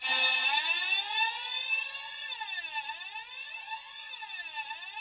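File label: Rough Strings A4, Yamaha VP-1; 90 s Sample CDs; Music Store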